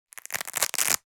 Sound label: Velcro wallet open; purse